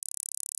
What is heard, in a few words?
Gauss Filt C#1